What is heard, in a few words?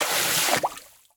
Liquid Water Water Spray Burst Of Water Bubble